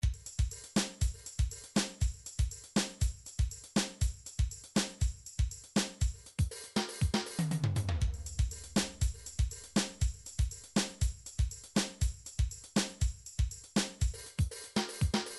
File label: drum midi files arab